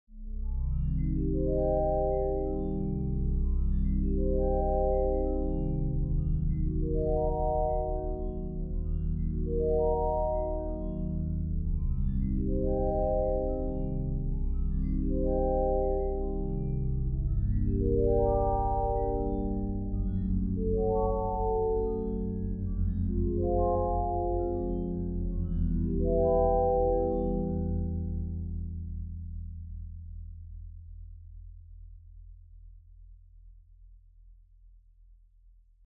kalimba

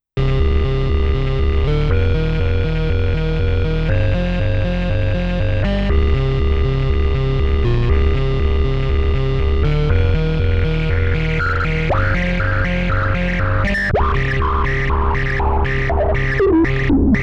8-bit circus

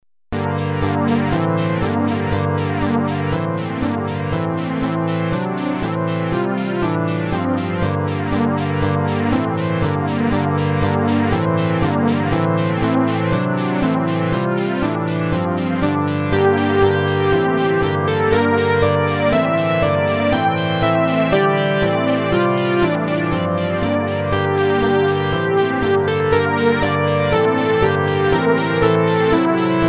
balalaika rastaman

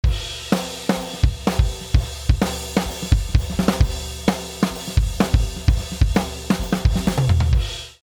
drums original

